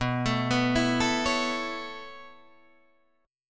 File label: B 7th Suspended 2nd Suspended 4th down Guitar DADGAD, chord; B7sus2sus4; arpeggiated